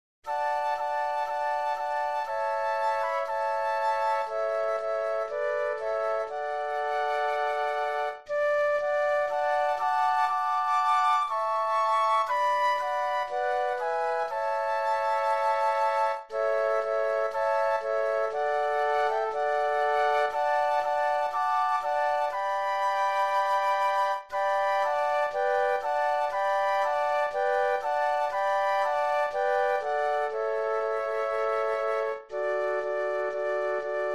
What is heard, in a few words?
Flute Trio